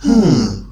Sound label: dialog warning